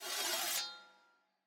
ambience room polygon cut training

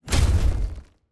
Enemy Shields AoE Hit; TANK; 3Souls

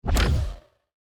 environment building upgrade low

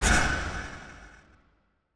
fallen projectile

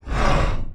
Demon Minion Get Hit; TANK; 3Souls

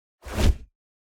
crusader strike whoosh

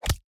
mob damage

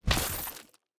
gold block destruction stone

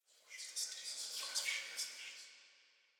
ambience room shadow room working layer

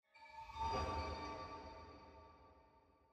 ambience room witch lodge magic

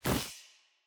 witch projectile; Shelter

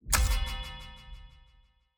battle preparation begin

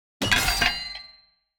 ui player morgenstern break

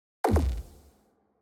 ui battle begin low layer

